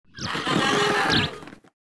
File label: greetings avian special; Stellaris